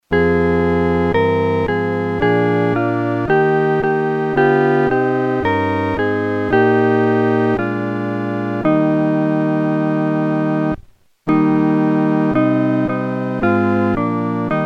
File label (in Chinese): 合奏（四声部）